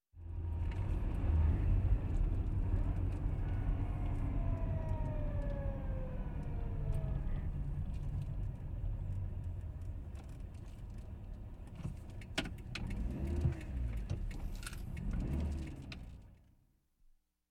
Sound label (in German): schaltgeräusche im auto; Auto-Zweiraeder